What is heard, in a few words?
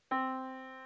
Yamaha Disk, piano sound